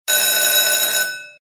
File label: RING LONG; Dazzling Diamonds